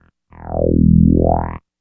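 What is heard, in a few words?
OWWWW, left; East West - Phat & Phunky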